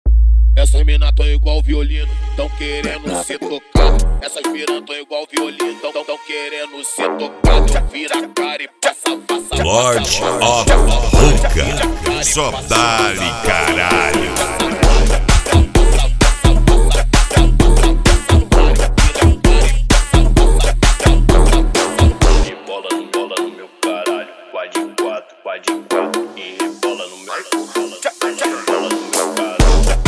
mega violino; lord of hooka eletrofunk

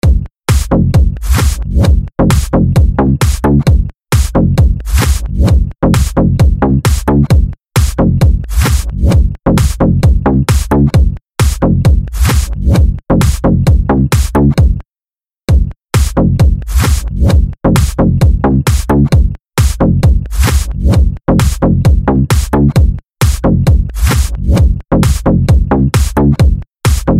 base tum dum dum bass; pack bases mega funk 2020

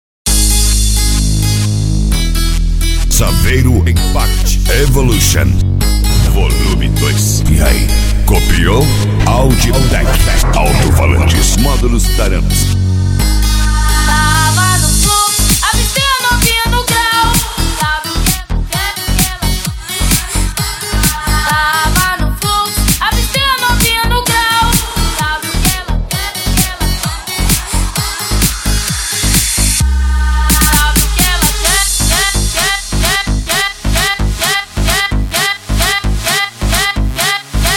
eletro funk; saveiro impact evolution vol2

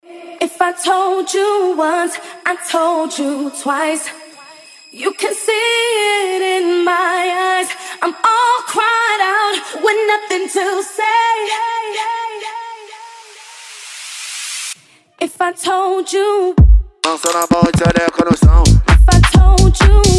som automotivo; rebaixados

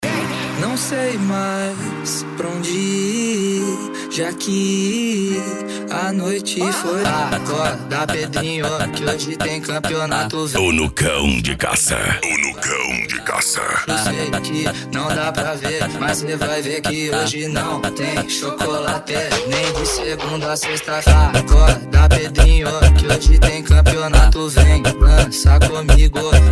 funk ritmado

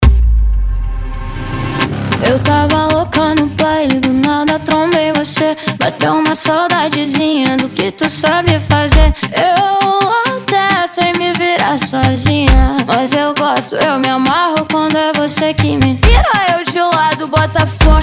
pagode e funk

